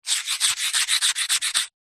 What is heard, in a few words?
chalk stick thick scribble street pavement; office